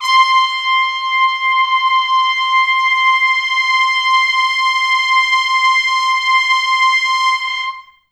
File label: C5 -R, Pop Brass; Best Service; ProSamples vol.19; AKAI